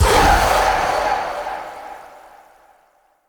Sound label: Rage Impact; Powers; Fey; Champion